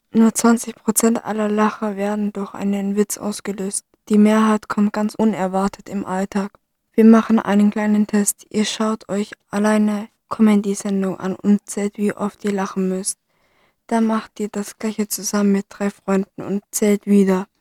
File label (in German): Lachen